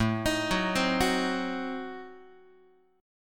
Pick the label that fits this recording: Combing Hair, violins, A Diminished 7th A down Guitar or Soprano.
A Diminished 7th A down Guitar